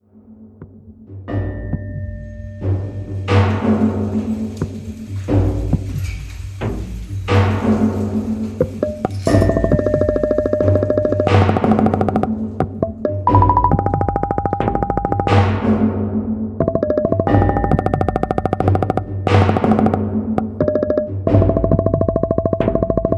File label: Delicate